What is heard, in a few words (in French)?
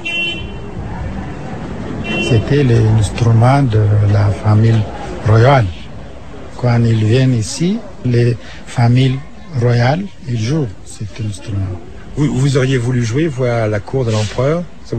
Harpe